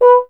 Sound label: F. Horn; Miroslav Vitous; Solo Instruments VOL-2